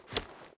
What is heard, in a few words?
flip, knife; weapons; Counter Strike Model Pack; CS2; knife ursus